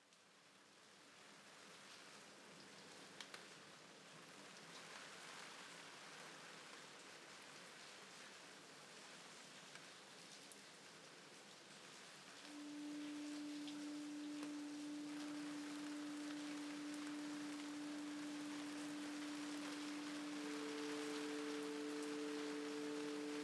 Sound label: rain